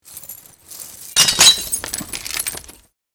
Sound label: Broken glass and debris rummaging